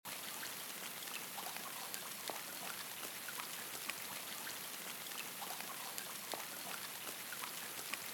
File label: rain